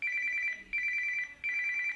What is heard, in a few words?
single tone, samsung galaxy y ringtones